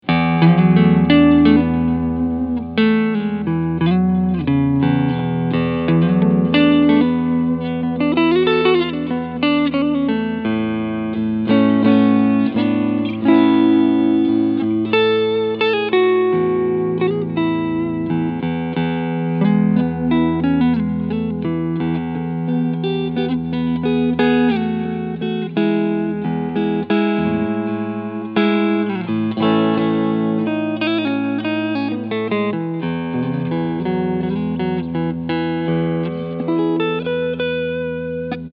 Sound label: Cleansound Front PU